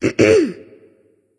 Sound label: nv clear throat; smite; voicepack; ratatoskr